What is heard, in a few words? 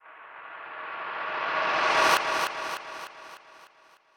fade in echo; OneShot Fading Memory